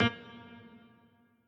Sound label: piano riff intro